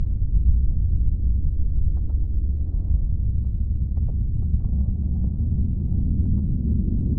lava; nalc game; env sounds

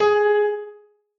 noteblock g sharp 2; mesecons

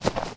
default grass footstep; nalc game

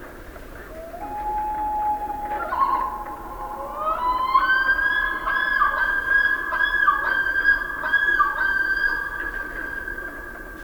canadian loon; ambience

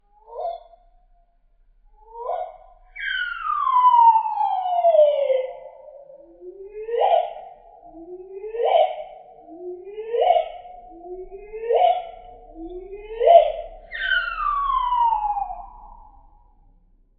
solitary bird song; ambience; dfcaverns

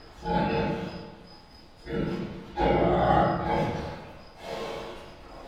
pig grunting grumbling; ambience; dfcaverns

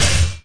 heavy shield

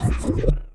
teleport; server-nalc; mana

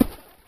default place node; nalc game

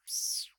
rat; server-nalc; mobs

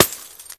nether pearl; nether-pack

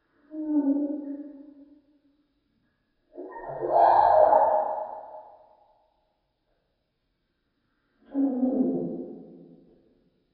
bird budgie song; dfcaverns; df ambience

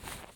trash

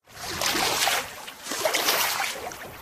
swim splashing; ambience

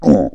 mummy hurt; tsm pyramids

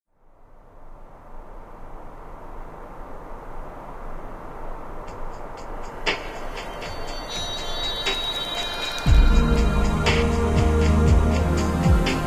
Faraway Short; Ambience

